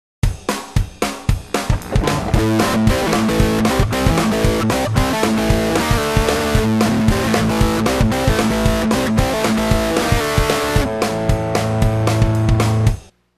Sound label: Rhythm Guitar